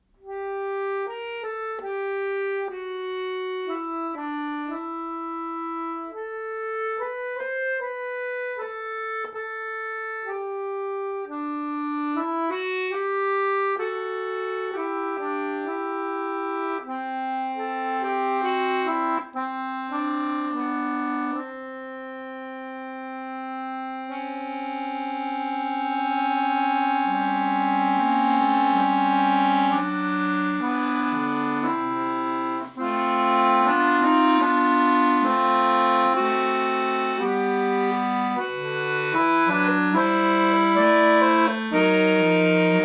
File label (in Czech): Akordeonove miniatury, Piesenka